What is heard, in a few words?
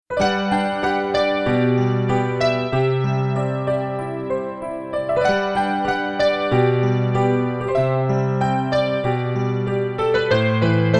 Piano Electric Piano